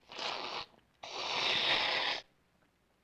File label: Child Soup Slurp; Vintage Cartoon; Comedy Vocals